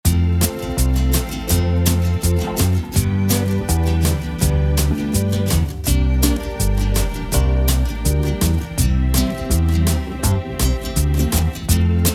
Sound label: instrumental, music folk pop country